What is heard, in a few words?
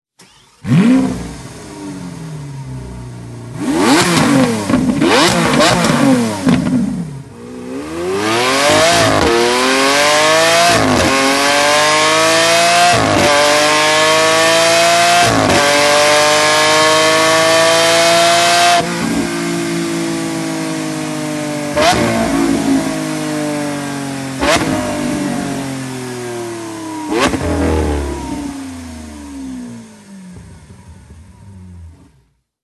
aventador exhaust sound; Akrapovic